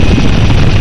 engine; falcon

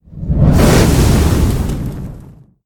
swing fire

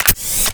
end ice

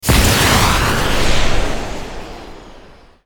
magic shot

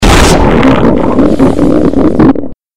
mirelurk death; magmalurk; npc

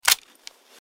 sniper rifle reload

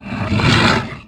vox attack

